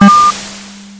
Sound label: Intercom Beep